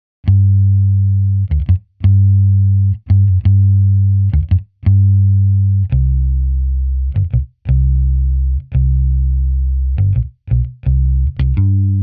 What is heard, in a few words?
Bass